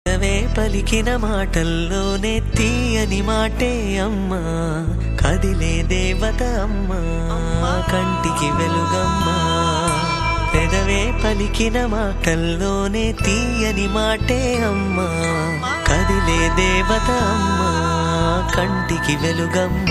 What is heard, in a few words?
Ringtone